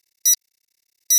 beep

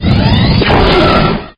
disruptor, weapons

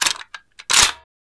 sniper deploy; weapons; unreal2

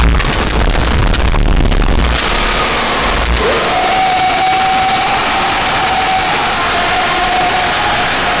scream; chatsounds

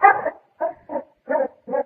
die; sven; npcs; mole people

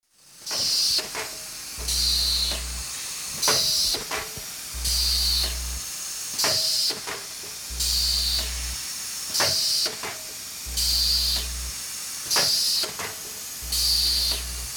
pumper

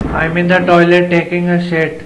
taking a shit

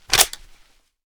close, m79

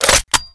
mp5k mag in

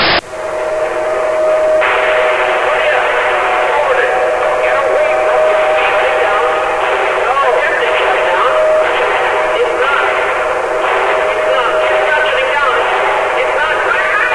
resonance; sven; platoon; dialogs

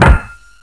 cbar hit, weapons mace

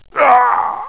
death; residuallife; ngrunt

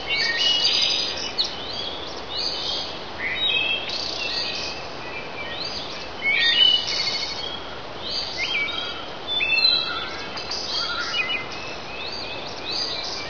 birds

